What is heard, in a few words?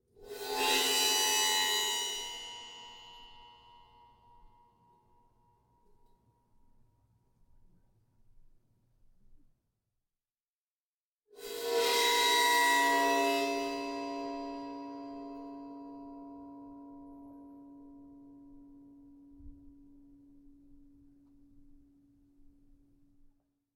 Instrument Cymbal Bowing Med Pitch Ringing; ShotgunMic; Guild Wars 2 (Original Foley Soundtrack)